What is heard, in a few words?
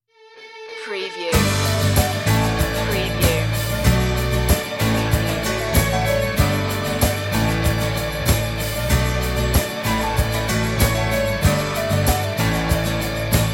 synth version